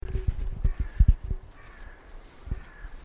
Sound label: crow